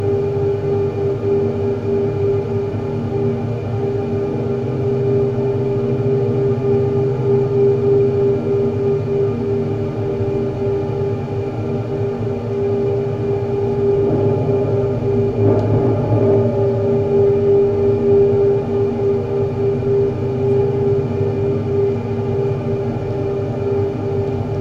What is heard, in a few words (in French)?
Barrage Hydroelectrique Plobsheim